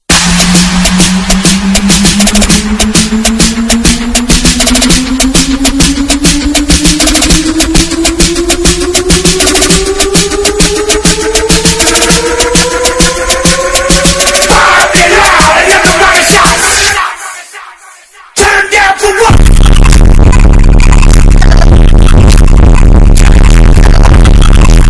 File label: bass boosted